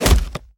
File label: Drone; Rabbids Multiverse